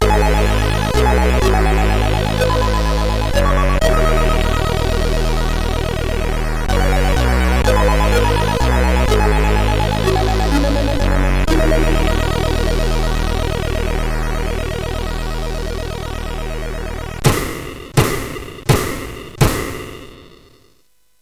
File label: Intro, sid CSG8580R5; hvsc